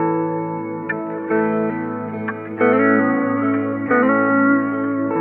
Psilosonic Guitar Phased; Sonic Foundry (Sony Creative Software); Ma Ja Le Ethereal Textures

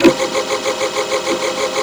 CLUTCH; Distored Reality

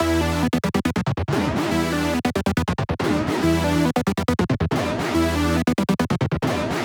140BPM E; Vengeance - Essential Clubsounds Vol.4

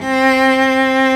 C3 CELLO; SOLO; Instruments & Percussions; InVision Interactive; Lightware VOL-1

